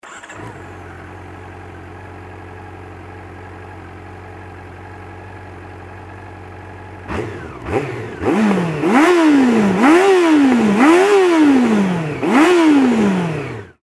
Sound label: cb400; cb400sf